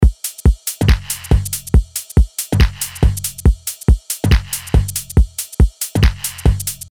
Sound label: 140 Drums Full